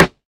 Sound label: bassy snare one shot f sharp key